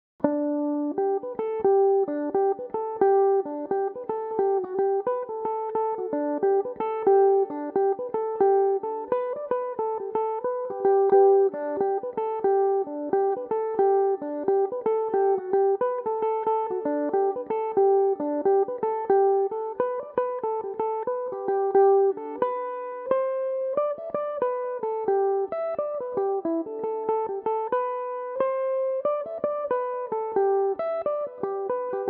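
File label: jig g major, mandolin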